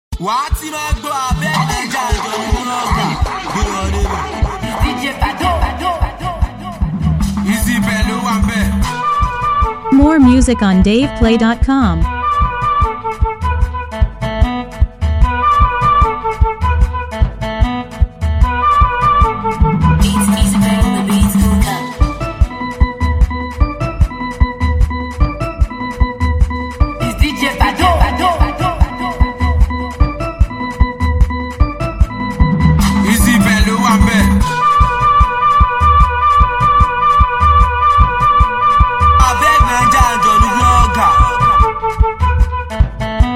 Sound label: Beat